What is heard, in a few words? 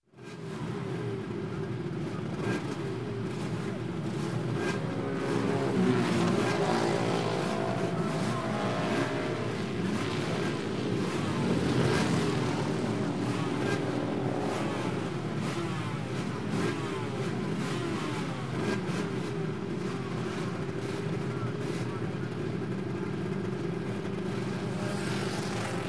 Motorcycle, Race Pit Ambience, Revving & Leaving; Sound Ideas; Series 2000